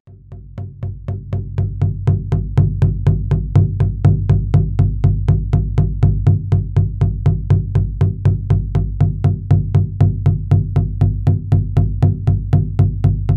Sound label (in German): Handgeschlagene Trommelrhythmen, 120 bpm; für Trance-Reisen; Live eingespielt